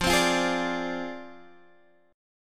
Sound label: F Minor 6th F down Guitar Standard; Fm6; strummed; JGuitar